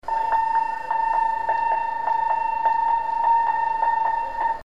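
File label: Halifax Class Action Alarm, navy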